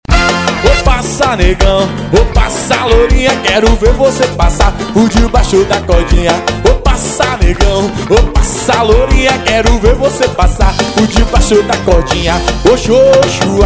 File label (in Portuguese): viola das antigas